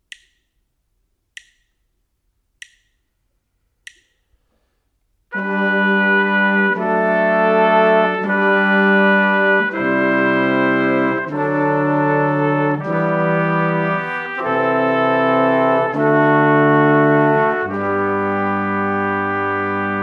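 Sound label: harmonic dictation, brass quartet; ear training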